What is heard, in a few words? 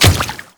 Grineer Royal Guard Water Step, Footsteps